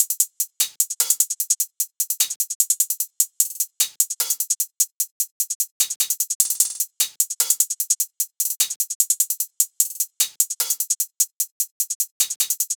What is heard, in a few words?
Drums 150bpm Hi Hats Loop Trap